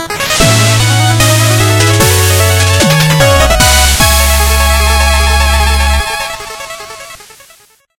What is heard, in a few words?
Music Boss Victory; Shovel Knight King of Cards